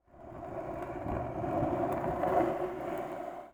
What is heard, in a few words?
Sports Skateboard Board Being Pulled